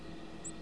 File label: oven